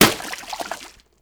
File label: Water Balloon Impact